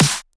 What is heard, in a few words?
soft hitclap